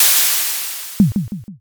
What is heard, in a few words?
airlock